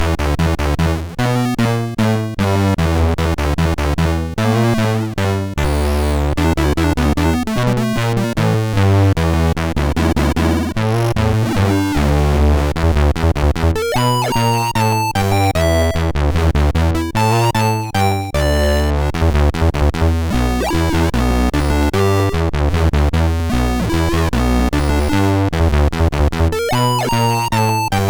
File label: Blues, MOS6581R3; Spike Super Blues Experience